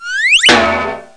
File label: wiz bong; CD-ROM Today (Latin America) Volume 1